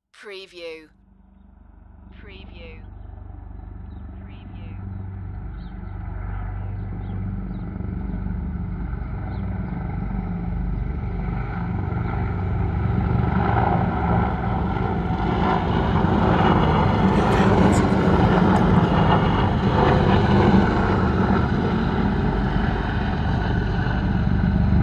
Four Military Helicopters Fly Over a Vehicle on a Rural Location; beanstalk audio